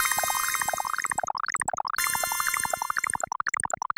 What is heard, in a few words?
Electro